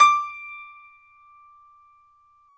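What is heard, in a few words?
piano, Grand piano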